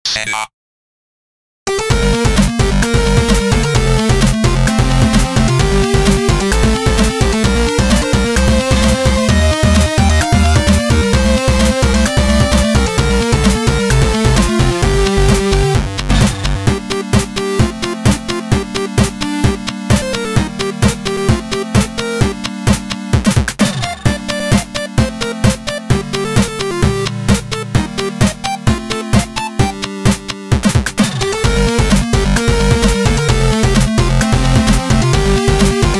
C64 + GB

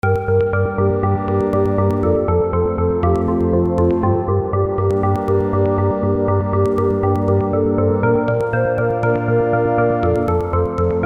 barka space

